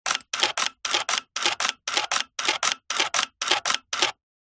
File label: technology camera dslr take pictures many auto fast shutter close open; zapsplat